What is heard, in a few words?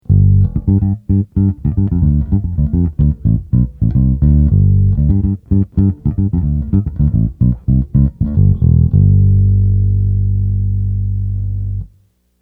fliptop